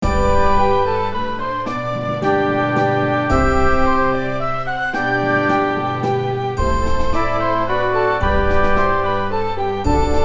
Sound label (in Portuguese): Minueto em Sol Maior; Bach